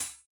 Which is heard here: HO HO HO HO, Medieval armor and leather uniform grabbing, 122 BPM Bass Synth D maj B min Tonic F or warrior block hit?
warrior block hit